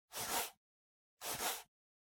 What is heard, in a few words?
PC Mouse Move Soft Pad Short